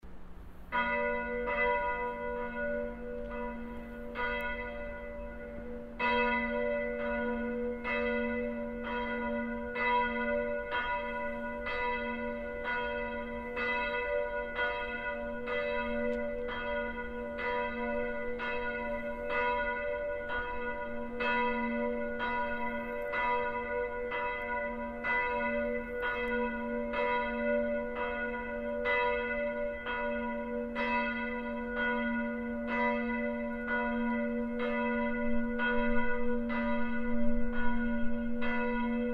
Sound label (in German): Glocke; St. Emmeram; Abendl. Nachläuten zum Totengedenken; historische Glocke von 1906; St. Lorenz